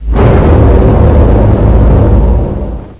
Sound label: horror; quake2; kitsune